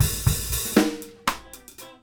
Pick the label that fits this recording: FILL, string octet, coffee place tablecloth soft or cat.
FILL